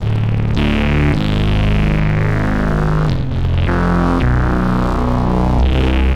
Bass, Futurist Drum & Bass

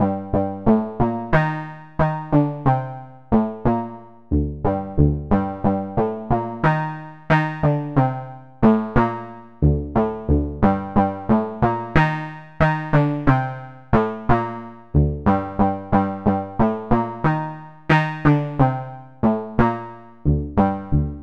Pulsing Synth; Sonic Foundry (Sony Creative Software) - Club Joints and Street Anthems; Time Traveller

